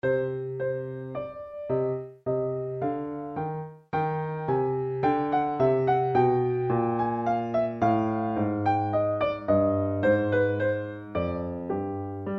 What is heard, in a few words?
Bach Menuet Do Minor